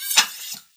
holster; weapons; darksouls

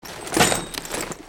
spike strip impact; gow; fortifications

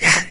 melee, maggot; doom3

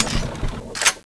clip out, weapons; cso; turbulent11

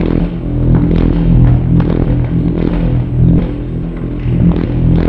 machine ambience energy; bms ambience

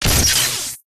security bot electric shock; gow; fortifications

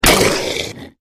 trog death

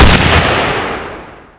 50cal; weapons

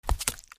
trog foot

